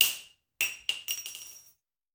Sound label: Bullet Shell; Sound Ideas; Guns